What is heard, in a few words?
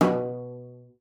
CELLO FINGER B2; Xsample VOL-5